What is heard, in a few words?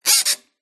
Stress Creak Squeak Groan; PMSFX